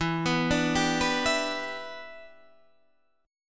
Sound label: E Minor 7th, Guitar, Drop D